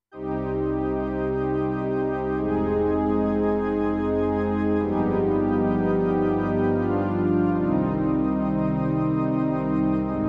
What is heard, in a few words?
Rotary Strngs